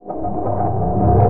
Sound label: Engine charge